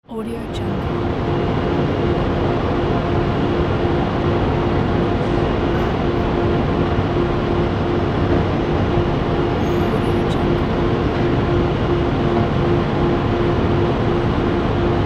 factory atmosphere

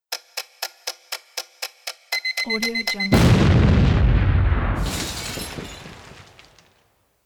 quiz countdown with explosion

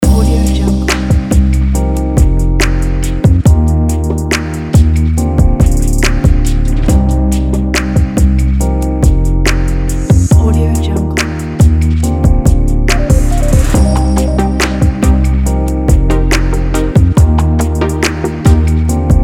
mysterious hiphop; bransboynd

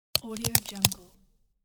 briefcase unlocking

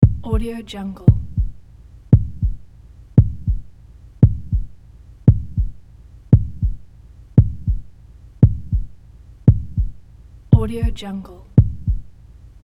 heartbeat

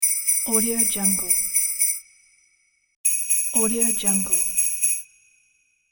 christmas bells

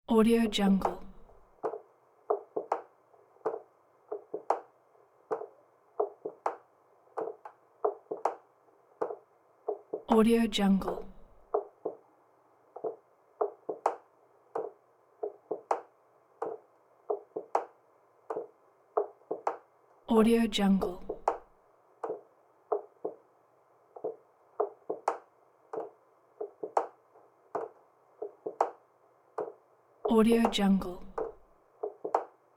slow rocking chair on wood floor; sound ideas

